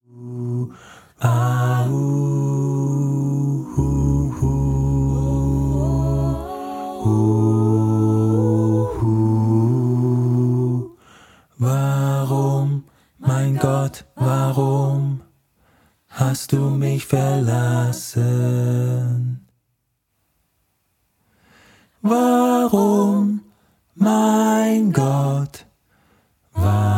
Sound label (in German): Lern-Bass